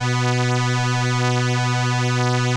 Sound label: ELKA, String Machines